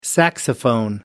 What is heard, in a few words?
saxophone